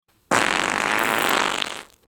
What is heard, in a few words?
extended long fart